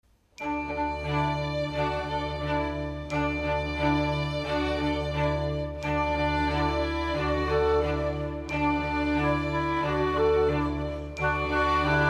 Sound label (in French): orchestre coda; petit prince